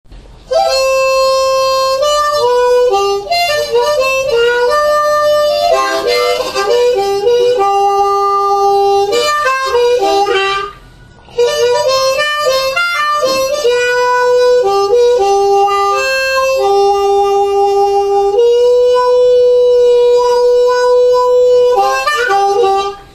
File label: blues